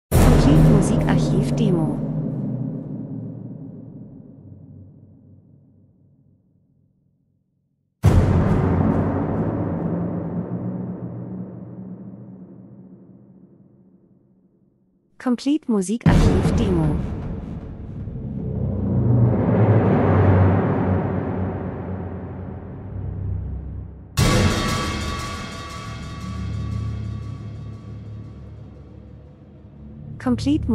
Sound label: drums; Timpani Becken mit langem Hall Opener Spannung Höhepunkt Weite TV Krimi Spielfilm Dokumentation